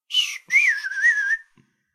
Whistler bird; SyrinScape; Syrinscape unplugged; Jungleplanet dinolaser battle

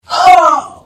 Hit; The Elder Scrolls III - Morrowind

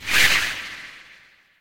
Dragon claw scratch; SyrinScape; Madness in the library

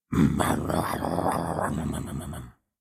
Dino growls; SyrinScape; Syrinscape unplugged; Jungleplanet dinolaser battle

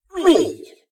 Combat; Eshimal; SyrinScape; Snarl and Oraculum

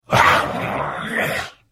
Hit; The Elder Scrolls III - Morrowind

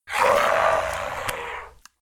Ghoul Growl; SyrinScape; East Ward

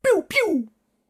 laser guns

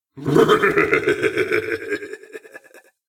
laughter; Eshimal; SyrinScape; Snarl and Oraculum

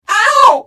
Hit; The Elder Scrolls III - Morrowind

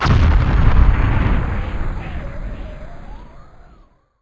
heart dead; The Elder Scrolls III - Morrowind

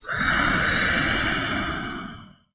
scrm; The Elder Scrolls III - Morrowind

